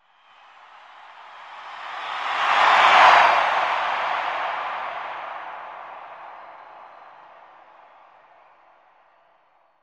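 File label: Arena Crowd Cheer; sports arena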